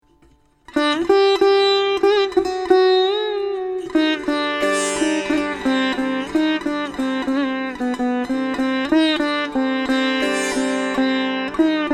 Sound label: Ringtone Android